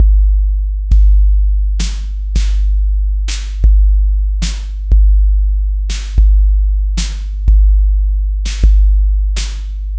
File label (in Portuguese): tambor de umbanda, ritualistic